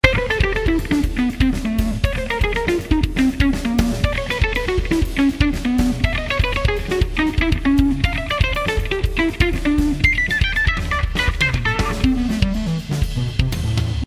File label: Jazz Guitar; Ueberschall Jazz Guitar